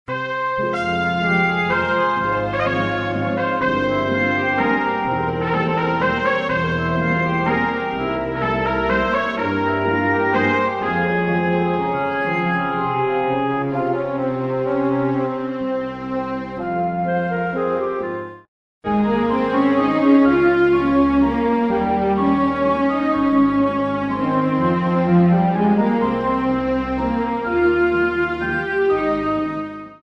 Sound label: Melancholy orchestral